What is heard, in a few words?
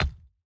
build concrete medium; Factorio